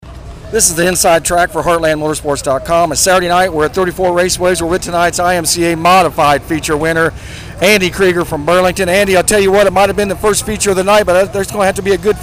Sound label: IMCA Modified, Raceway; Burlington; West Burlington, Iowa; 5-26 34 Raceway